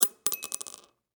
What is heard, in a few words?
Emergency Pill Drop Glass Medicine; Drops